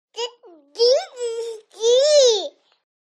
Baby Girl Gibberish, nineteen month old

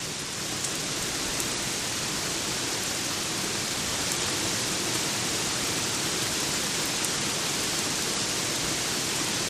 Rain Hvy On Pavement

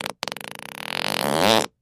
Character Fart; farts

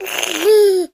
Baby: Happy Noises And Coos

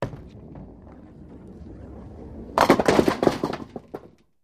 Bowling Strike

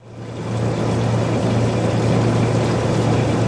Laundromat Loop, laundromat; Laundromat Loop Gene

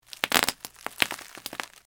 Bubble Wrap Popping

